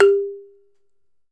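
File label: BALAFON, Pitched Percussion; E-MU Producer Series Vol. 7 – Old World Instruments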